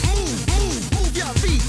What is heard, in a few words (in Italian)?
Messaggio o Avviso; Winferno